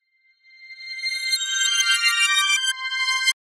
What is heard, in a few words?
rev glass; reverse